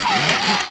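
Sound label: droid attack heavy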